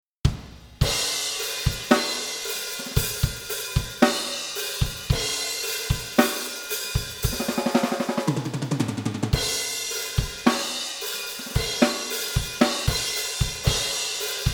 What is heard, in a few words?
Drum Processing; Maul